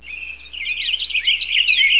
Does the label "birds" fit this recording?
Yes